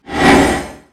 Swoosh

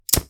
Lighter

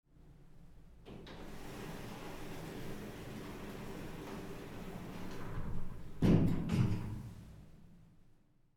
Elevator Door Outside Close

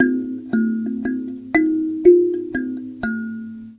Indonesian Thum Loop

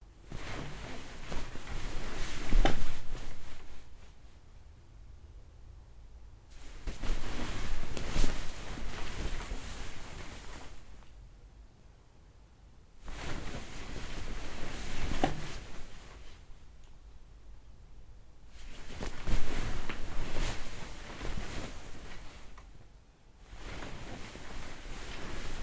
Sitting Up Down In Bed

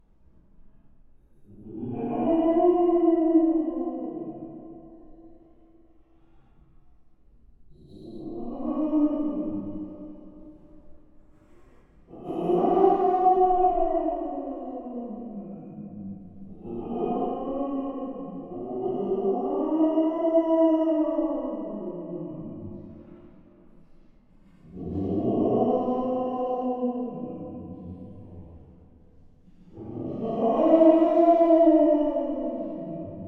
Werewolf

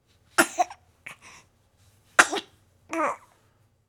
Baby cough

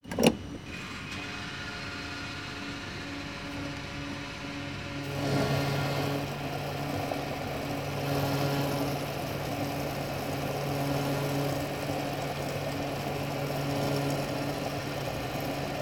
Gasoline Filling

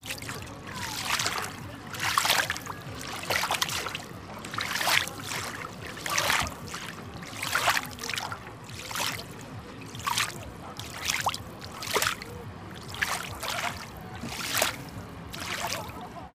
Water Splash

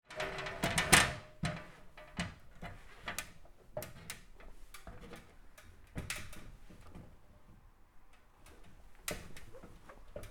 Up Down Aluminium Ladder

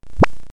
Sci Fi Glitch Sound

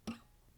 Fret Noise

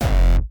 Kick Sample

